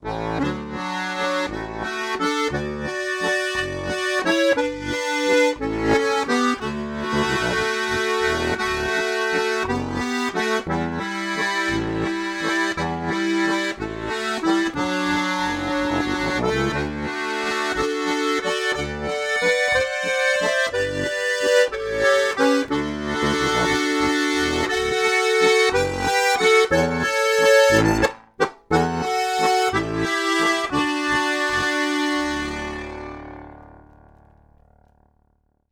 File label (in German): ADGC Offen, harmonika